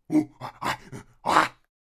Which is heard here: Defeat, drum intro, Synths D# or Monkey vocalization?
Monkey vocalization